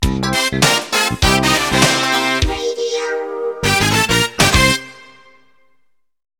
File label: Win; Race With Ryan; PS4, Stadia, Switch, Windows, Xbox One